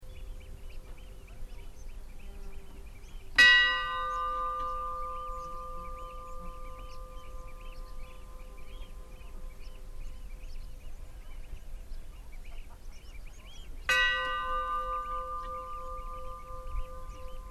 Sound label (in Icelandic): stadarfellskirkja likhringing; Staðarfellskirkja sem nú stendur var vígð árið 1891